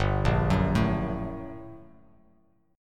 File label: G Minor Major 9th, down; arpeggiated; JGuitar